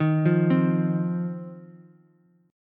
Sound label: C Minor Double Flat 5th C down Ukulele; Cmbb5 arpeggiated; JGuitar